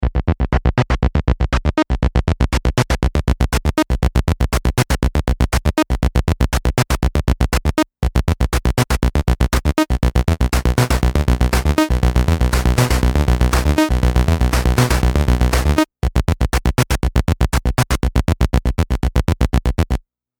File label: Mopho Rocker; DSI